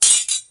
sword clash

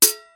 sword clink

